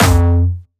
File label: F2, Jumpstyle Kick; vengeance essential club sounds-3; VEC3 Bassdrums